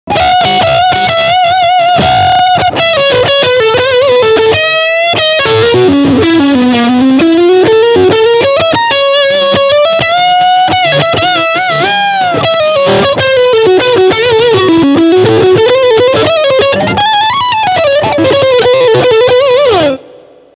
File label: Heavy dist.